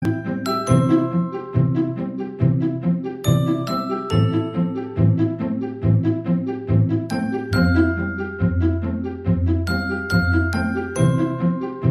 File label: music; jellyslice